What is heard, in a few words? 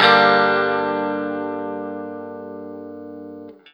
PRS CHRD MIN, Guitar; 90 s Sample CDs; Quantum Leap - Guitar & Bass